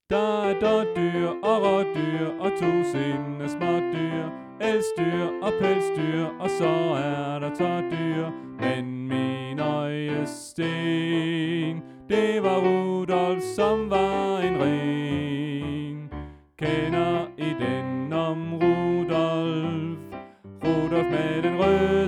Alt2; oevefiler

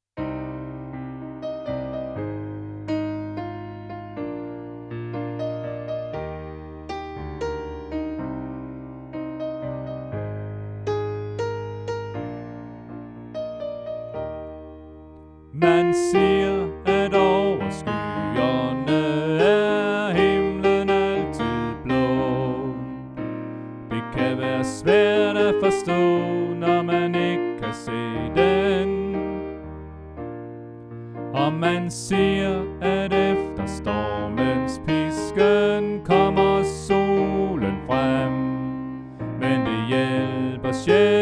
Alt; oevefiler

